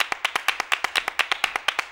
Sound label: MULTCLAP, MULTICLAPS; Best Service; World Colours